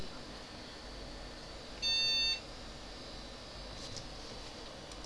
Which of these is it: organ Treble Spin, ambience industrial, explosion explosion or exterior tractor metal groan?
explosion explosion